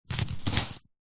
warfare knife dig push slow into grass mud deep

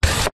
foley plastic slider slide; zapsplat

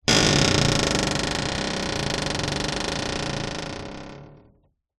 household door creak heavy large antique

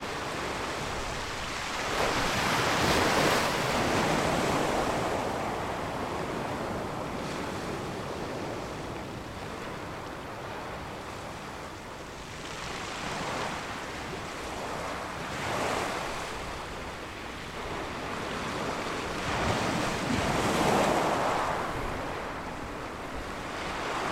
water ocean waves break on beach

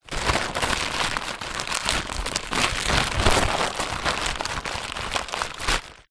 foley tent pole bag empty movement

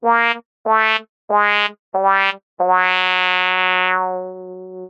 cartoon fail trumpet wah; zapsplat

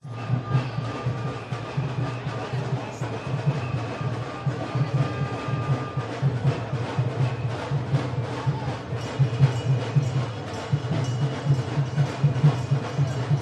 atmos protest steady drums; london music mixing

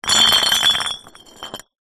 bottles glass x14 knock over concrete

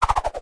whoosh swoosh throw spin air; zapsplat

